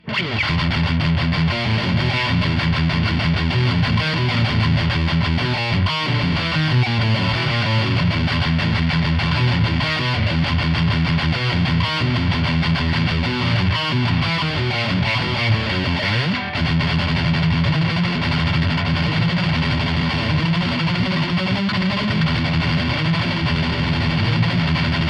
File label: riff